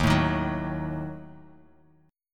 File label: Eb Diminished, Guitar Half Step Down